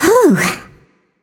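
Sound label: nonverbal sigh; smite; voicepack; izanami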